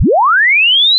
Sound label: sweep